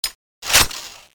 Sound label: drillgun reload